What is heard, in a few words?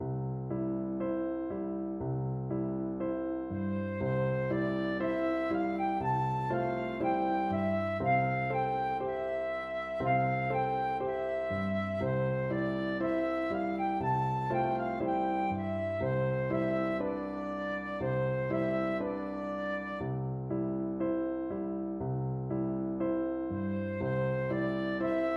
lullaby flute